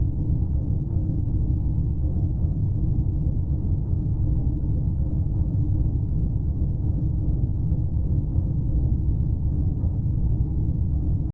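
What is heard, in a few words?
Amb Hangar; Fur Fighters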